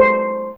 Steel C4, Fairlight CMI IIe, Mallets

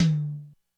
HiTom, Korg DSS-1

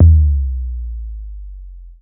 ELE BD, Drums Dance & Groove; USB Soundscan vol.11; AKAI; ELEC. KIT

